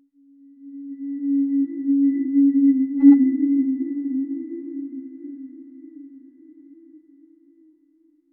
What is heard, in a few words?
very subtle ghostly echo